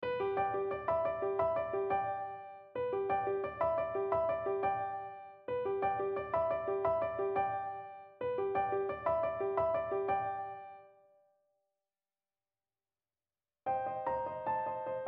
Apple & Nokia RingTone

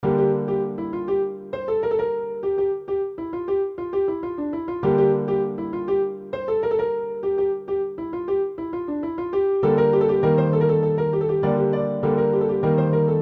piano

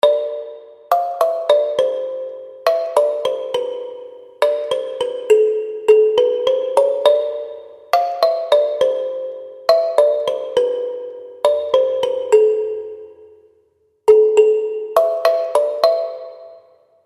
kalimba

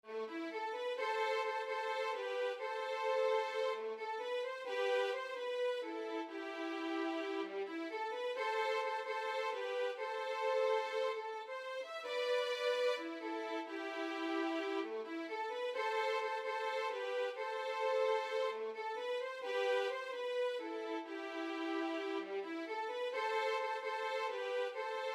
Violin

